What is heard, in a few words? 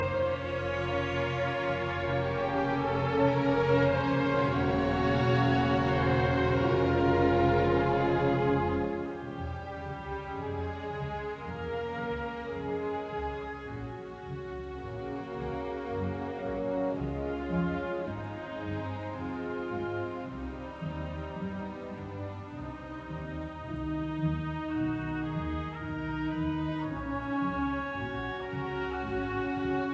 Symphony in C minor, Andante Feierlich etwas bewegt; Symphony No 2; WAB version ed R Haas